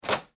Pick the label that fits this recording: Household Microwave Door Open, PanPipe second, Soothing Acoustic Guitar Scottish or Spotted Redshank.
Household Microwave Door Open